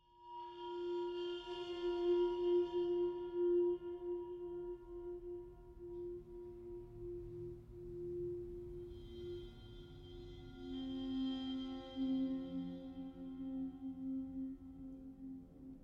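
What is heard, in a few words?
Marimba and Cymbal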